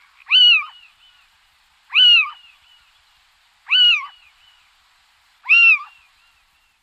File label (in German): steinkauz male 4x call